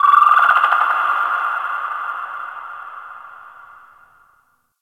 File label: Underwater Creatures Low Medium, Ocean; Warframe; Grineer Ocean